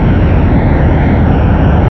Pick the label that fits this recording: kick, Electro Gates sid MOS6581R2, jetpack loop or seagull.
jetpack loop